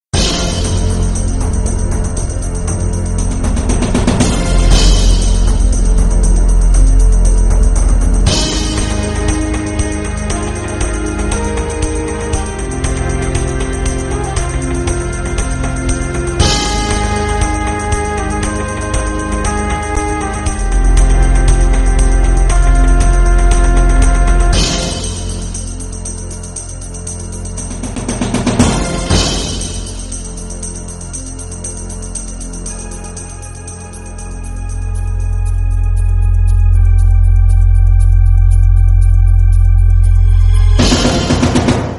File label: game sound ringtone; AGE OF EMPIRES